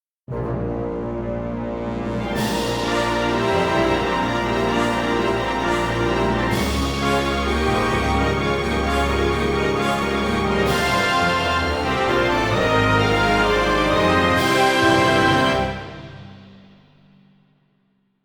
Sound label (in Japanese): ファンファーレ